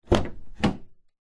vehicles suv door open in garage nissan patrol

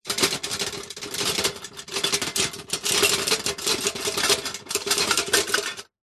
metal loose pots rattle shake fast

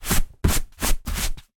foley hand rub flick dust dirt from leather sofa

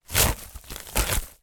office envelope padded rip tear open

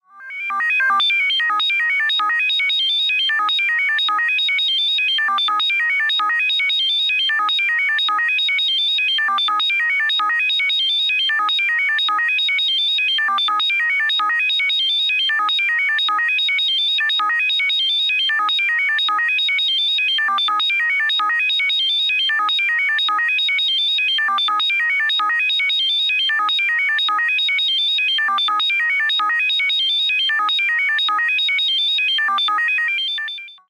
science fiction robot processing tone long; zapsplat

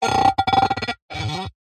foley glass jug thick finger rub squeaks

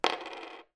money pound coin down on table

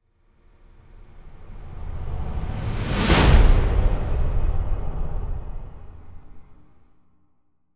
Swell To Impact Flanged; bottlerocket

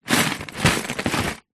leisure box movement table; lego

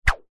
foley cable whoosh air

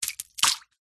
nature ice slushy melted pick up drop splash

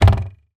household cupboard door close bounce; zapsplat

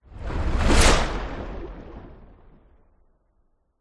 water whoosh impacts movements; george gazetis

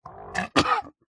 horror monster retch

